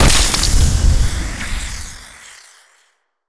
voidspawn missile; Stellaris; distant stars

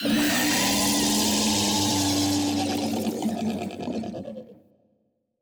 cutholoids death; Stellaris; grand archive; ships

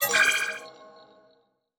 specimen sell; Stellaris; grand archive

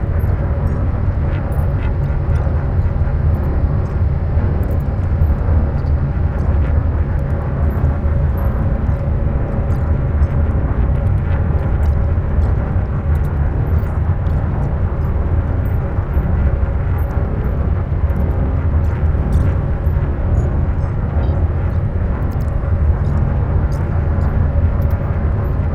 science ship hum; nemesis; Stellaris

